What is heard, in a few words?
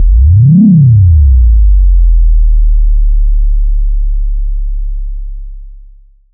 SYNTH FX